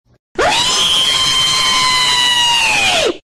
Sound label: REEEEE; cstrike; sank sounds